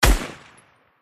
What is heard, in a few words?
epic shot